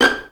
VI PIZZ G5, Advanced Orchestra; Best Service; Peter Siedlaczek's Advanced Orchestra

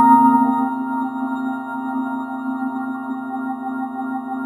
BELL ORGO; Synth FX Misc

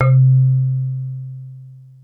mallets

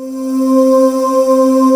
SY CLEAN PAD, FM AND SY

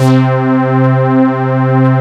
SOLID M SYN, SYNTHS; Pro-Rec - Synthsonic

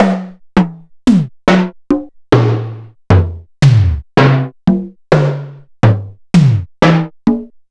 Simmons MTX9 Factory A

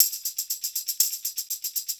TAMB, 120 PERC; Best Service Advanced Media Trax Modern Composer

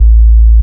sub A, techno trance bass

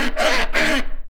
SCRUB, HOUSEHOLD; AKAI Anime Sound FX

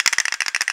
CASTANETS TRILL; AKAI S6000 CD-ROM - Volume 5

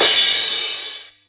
Yamaha EX-5

